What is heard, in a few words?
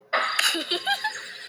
cute giggle